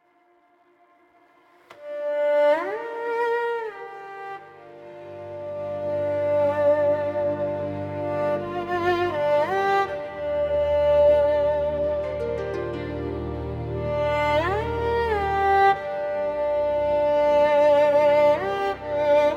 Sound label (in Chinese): 局外背景音乐